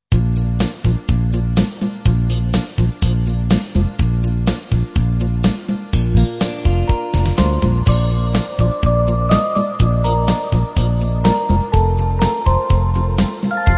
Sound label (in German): Schlager